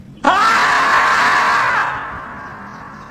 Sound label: beaver scream